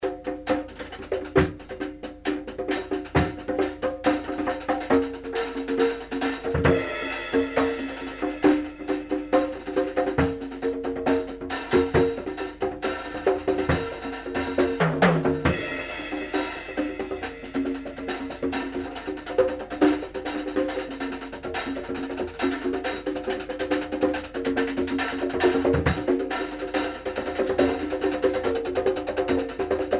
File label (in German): Percussion